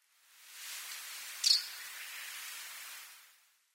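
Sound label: Common House Martin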